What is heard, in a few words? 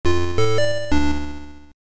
jingle intro end